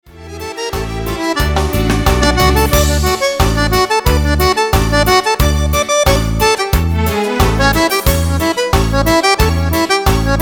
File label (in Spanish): cumbiando